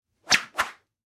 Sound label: shrapnel whiz